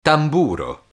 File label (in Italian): tamburo